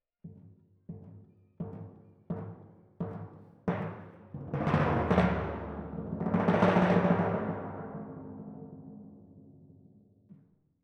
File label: Twin F Timp